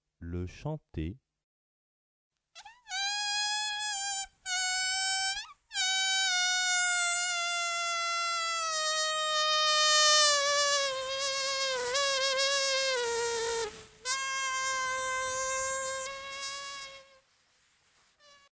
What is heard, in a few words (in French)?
chante